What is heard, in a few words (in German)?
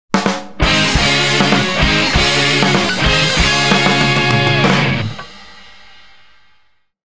Tusch; Dichter